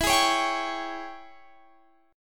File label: G sharp Major 7th Suspended 4th Sharp 5th, E